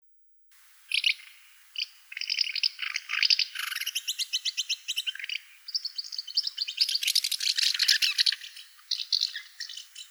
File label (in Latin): Delichon urbicum